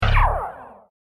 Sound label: Laser Pulse Cannon, laser gun, science fiction sound effect